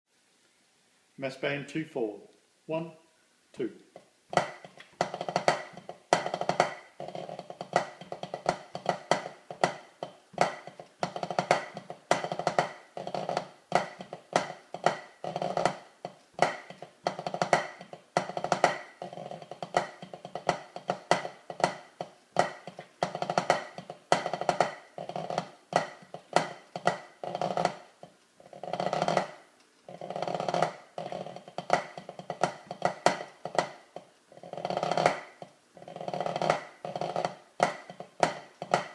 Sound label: Massed Band 2/4 Includes Extended Part, pipe band